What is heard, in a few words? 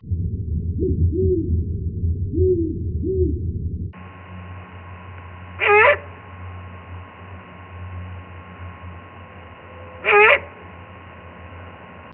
Owl